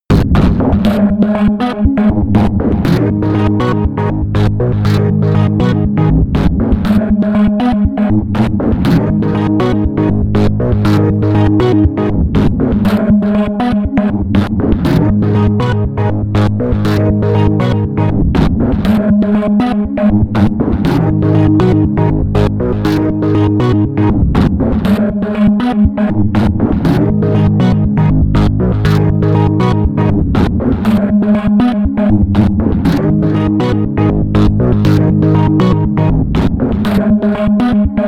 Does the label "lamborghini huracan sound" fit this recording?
No